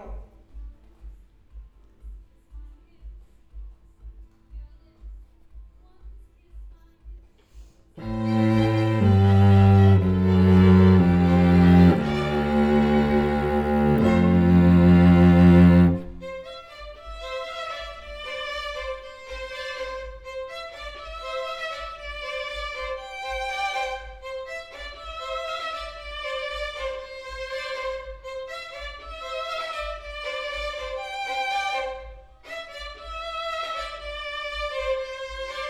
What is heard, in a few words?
Cello